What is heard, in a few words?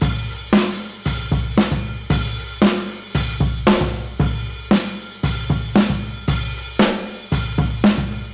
115 C Black Fuzz Beat